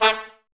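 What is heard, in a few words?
brass trumpets A; Miroslav Vitous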